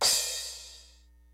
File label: LIVE AC KIT, DRUMS; Kid Nepro; Elite